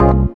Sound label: synth chord, dance synth; Sound & Vision - Gigapack I CD 2 (Roland)